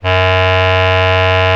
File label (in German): Bass Klarine; Best Service ProSamples vol.55 - Retro Sampler; AKAI